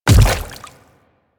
Infested Splitter Step Water, footsteps; Warframe; Grey Strain

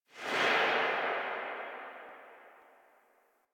Rifle Tail A; Weapons; Warframe; Tenno; Bard Rifle

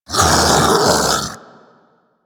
Infested Splitter Split Vocal Attack Medium; Warframe; Grey Strain

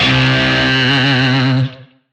Shawzin C; Warframe; Lizzie Shawzin

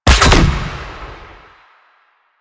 Chem Nox Grenade Shot; Warframe; Lasrian; Lasrian Soldiers; Weapons

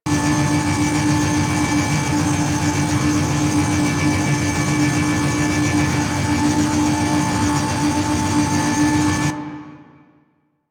Race Mode Ship Loop; Warframe; Ambience; Space Battles

